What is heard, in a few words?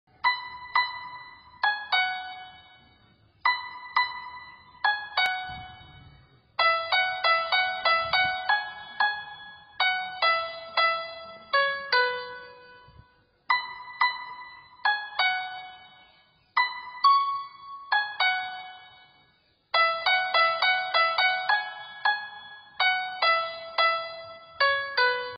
sad bgm piano version; aditya varma